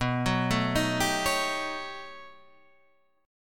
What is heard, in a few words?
B 7th Flat 13th B, guitar chord